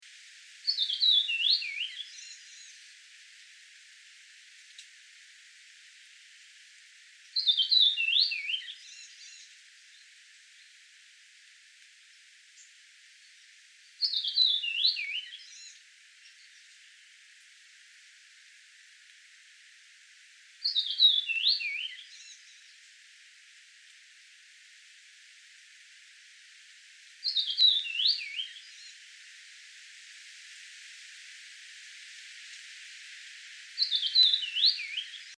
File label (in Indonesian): suara burung sikatan